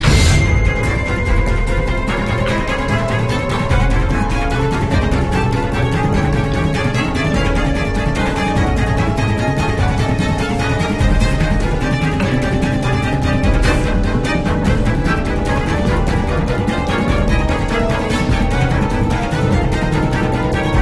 loop Action

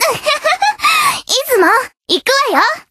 ahhahaha; moe pachinko; android game music

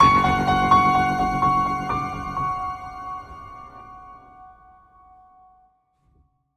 Stinger Stalk; Level 01

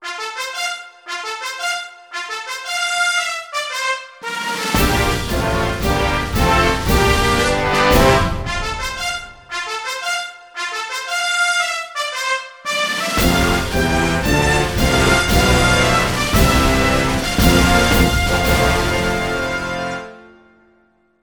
Fanfare; Champion Jockey: G1 Jockey & Gallop Racer; Xbox 360

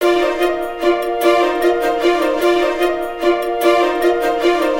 Tortoise start; lego universe macos windows gamerip 2010

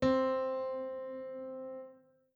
piano c1; through abandoned 2 the forest 2016 gamerip pc

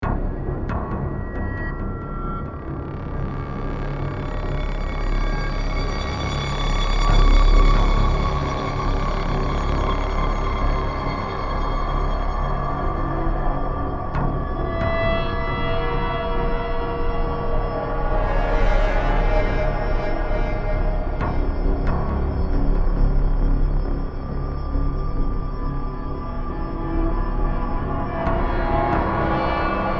Pan Tower Music Ambient

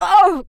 pain; celeste

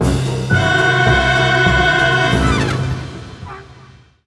Mission Failed!; the simpsons hit run; ps2

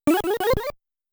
Fire Element Lighting; The Tower of Druaga

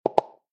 Ball Hole

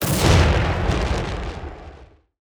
Attack Impact; portal quest android

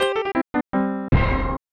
Tada; Heisei Shin Onigashima; snes

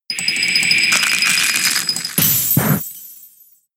Frost Breath; Pokemon; gen 6; XY; ORAS

